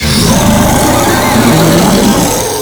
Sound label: Proto Infested Ancient Taunt Scream; Warframe; Abilities